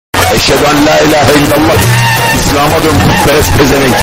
alert

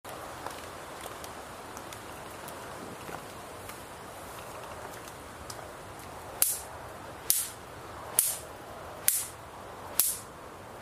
carpet beater

